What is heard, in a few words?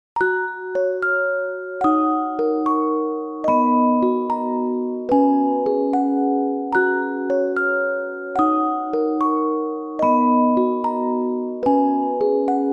chime